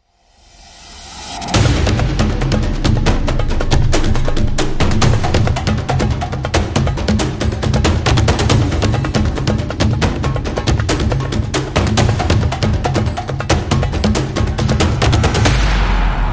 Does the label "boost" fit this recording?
No